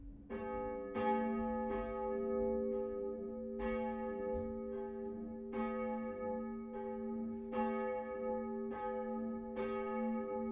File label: bell north heiden bieringerin; bells